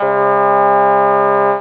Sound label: trumps; cadaver; Amiga Soundtracker Sample Packs